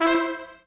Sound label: Correct; lego my style preschool